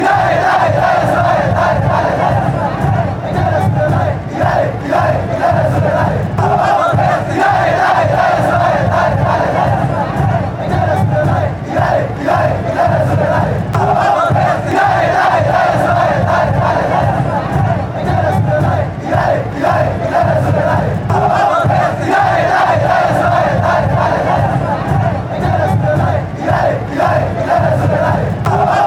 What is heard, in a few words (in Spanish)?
y dale y dale chant